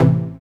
TECHNO E2; Jack Sparrow Deep Dubstep Progressions

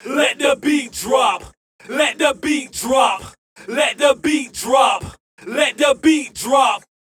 VOX

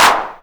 Claps & Snares

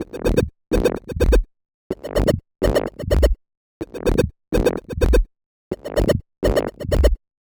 126 E G music, Funky Underground; Loopmasters; Funky Underground Vol 2

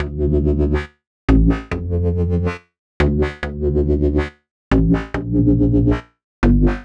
140 D BASSLINE, Dubstep

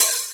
Open Hihat; Mutekki Media; Vengeance Electroshock Vol.2; VES2 Cymbals

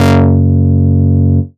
Bass Shot F#; Mutekki Media; Vengeance Electroshock Vol.2

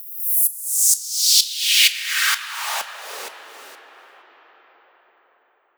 FX Downlifter; Mutekki Media; Vengeance Electroshock Vol.2

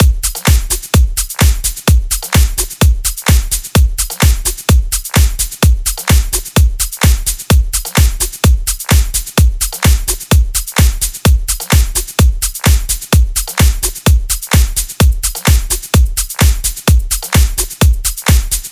NuTrance Kit Beat; Mutekki Media; Vengeance Electroshock Vol.2

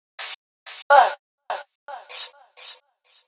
126 B VOCAL LOOP, Funky Underground; Loopmasters; Funky Underground Vol 2

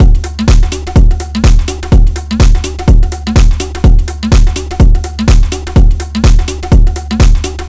125 BPM MPC, Digital Breaks; Loopmasters; Jon Carter & Alex Blanco; Block Party Essentials

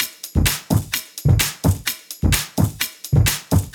Loop, 128 BPM

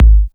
Kick

